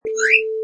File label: Slide Whistle Yo Yo Sound